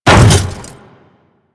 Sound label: Hammer Boss Step Default, Footsteps